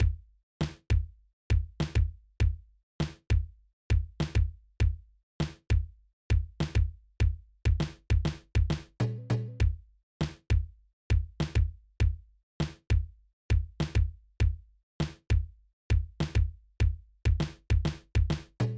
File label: Boom Beat